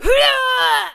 Pirate Queen Attack Vocals

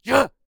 Stoner Attack Vocals

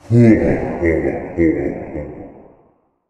Reaper Attack Vocals

